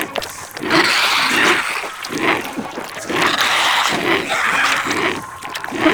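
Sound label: Infested Hive Pod Loop; Warframe; Ambience; NineteenNinetyNine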